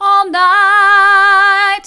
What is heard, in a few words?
all night mid, vocal